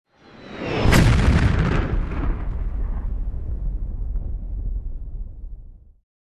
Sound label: Incoming missile large explosion